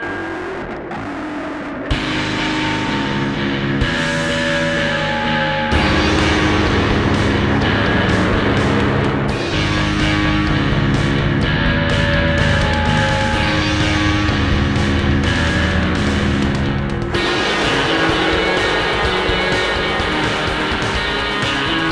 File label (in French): Guitare slow